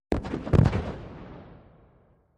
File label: 2x16 Oz Black Powder